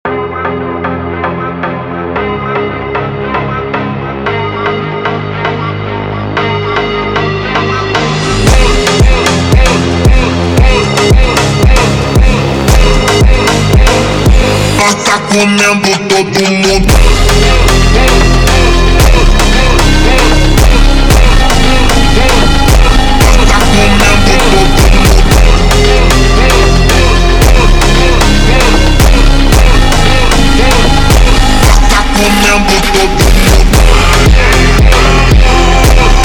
Funk slowed

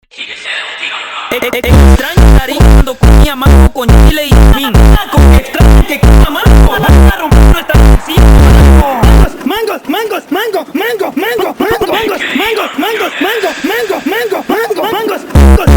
Phonk

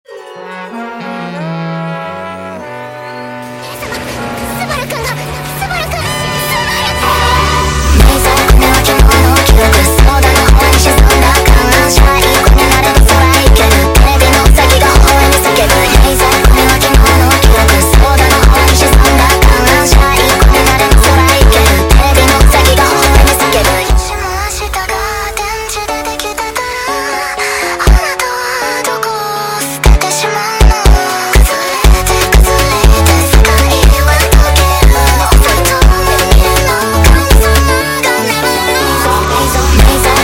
Funk sped Up

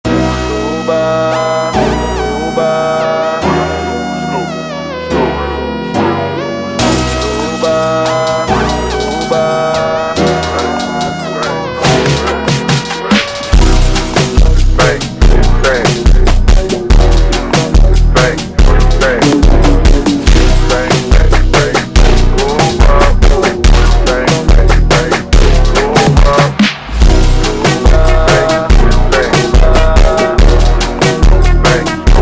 Brega ultra Slowed

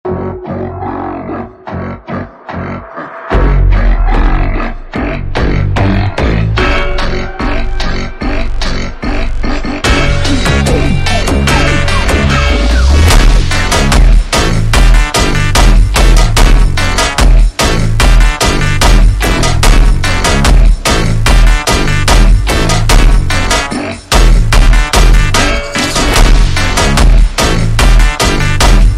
Montagem Ultra Slowed

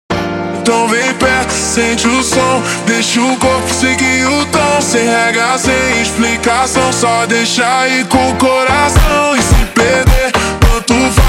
Montagem super Slowed

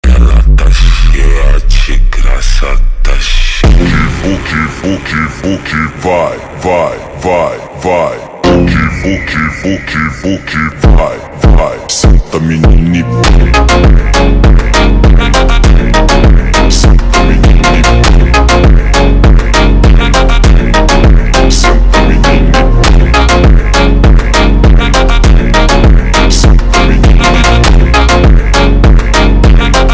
Funk Slowed

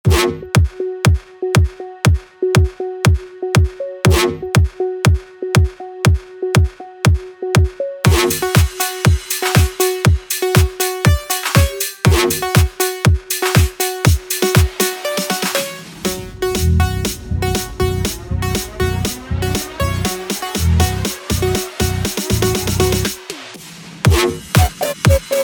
Uk instrumental

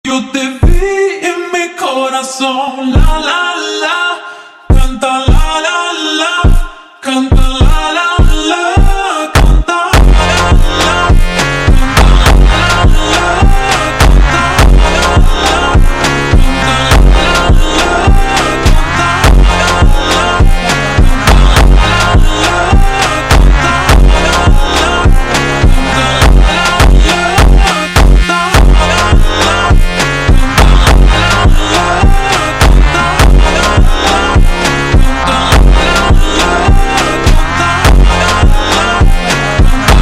super Slowed

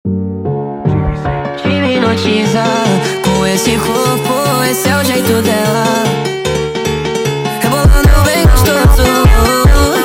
Montagem sped Up

